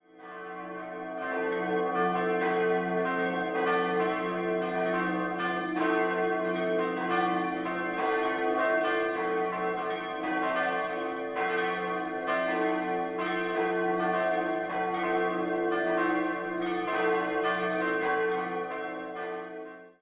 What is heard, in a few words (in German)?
Reichenau Oberzell Plenum